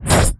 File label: Attack